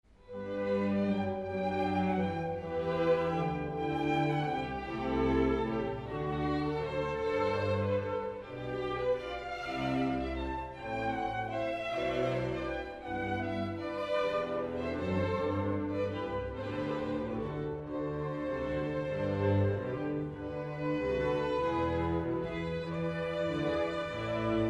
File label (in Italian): musica